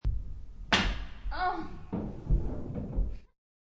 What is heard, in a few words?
Bad pool shot with groan of player.; Pool Scratch; Bars